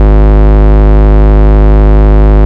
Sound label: Bass, Root G#; Vengeance Samples